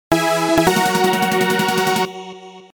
tettere

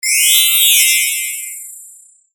chiririririn

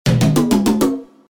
tatatatatata

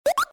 poko

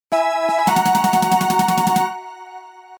tettere

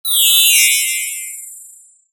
chirichiririn

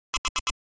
pipipipi

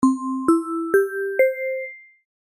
pinponpanpon

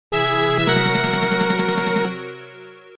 tettere

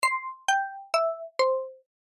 pinponpanpon end

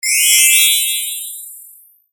chirichiririn

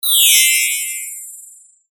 chiririririn

